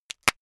plastic pressured single

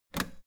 old button harsh push hard

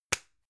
gum plastic box close hard

